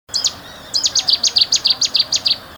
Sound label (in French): bouscarle de cetti